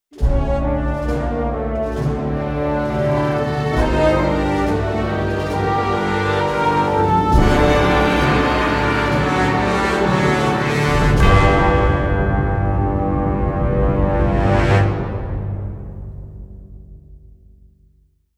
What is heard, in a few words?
Gameover; Fell Seal: Arbiter's Mark